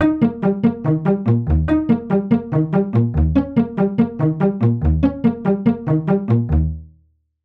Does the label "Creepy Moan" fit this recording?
No